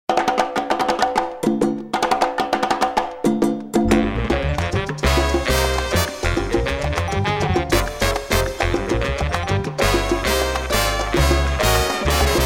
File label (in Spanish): Guaracha y Bembe